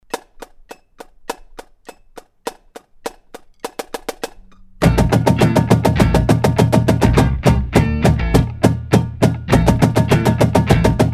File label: Timing w tracks Loud Only 102bpm